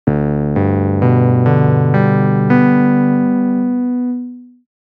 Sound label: Piano; j106